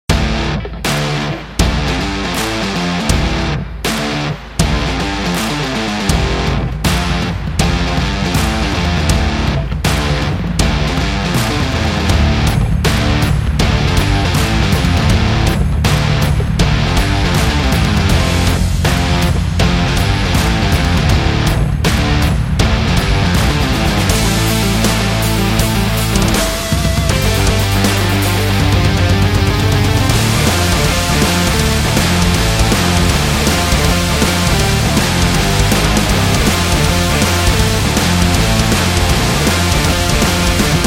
The Rock Trailer

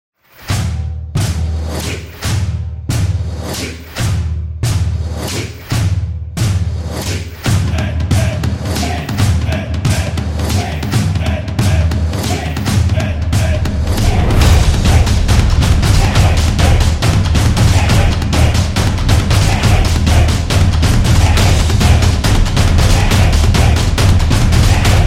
Stadium, sport drums

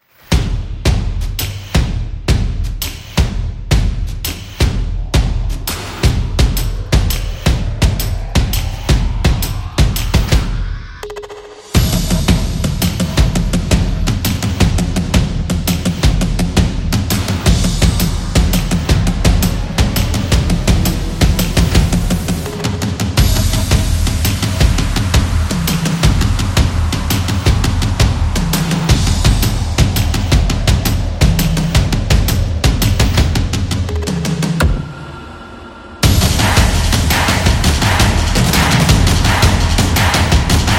This Is Sport Drum

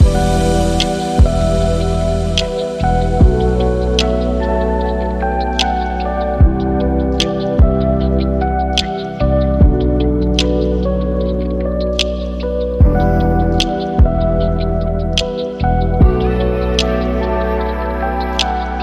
Lofi Loop

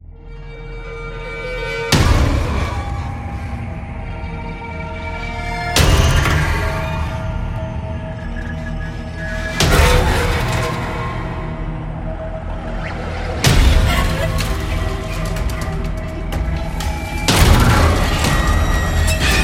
A Horror

